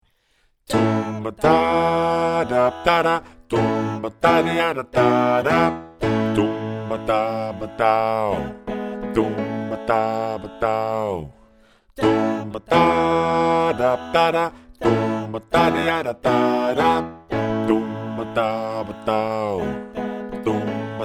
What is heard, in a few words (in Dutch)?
bas